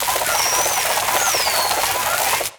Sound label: Fireworks Volcano Launch; angry birds rio